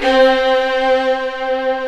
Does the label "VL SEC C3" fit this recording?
Yes